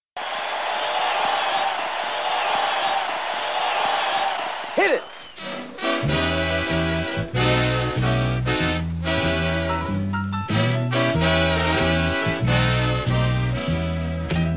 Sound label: Show Open NO voiceover; ComedyCaravan